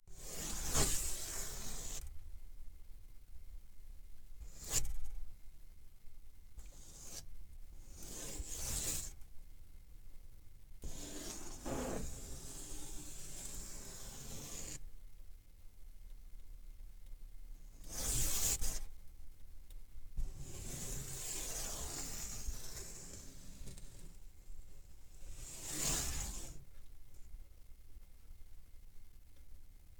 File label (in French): dessin craie